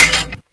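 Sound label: bomb detonation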